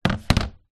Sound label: technology laptop apple macbook air down on table closed